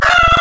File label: Chicken, animals; Synthetic's Serum Collection; Cymatics - Animals for Serum - Gold Edition Wavetables